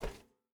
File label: footstep burlap metal walk; Rust